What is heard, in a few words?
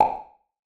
Reverb; Olcso Draga